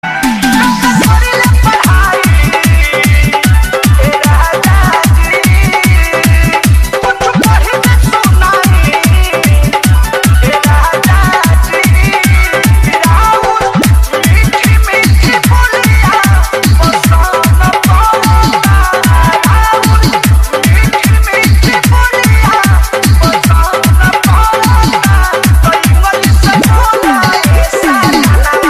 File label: cool hollywood ringtone